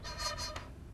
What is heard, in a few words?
flute